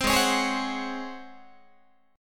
G sharp 11th G sharp, down, Guitar; G#11; strummed

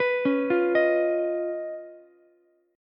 Db 9th Db down Ukulele C6; arpeggiated; JGuitar

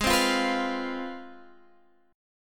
C Major 7th Suspended 4th Sharp 5th Ab, chord

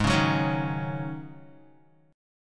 G sharp 7th Suspended 4th G sharp, Guitar; Listen to G#7sus4 strummed

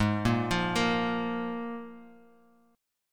F Minor Double Flat 5th

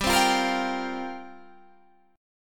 F Suspended 2nd Suspended 4th G

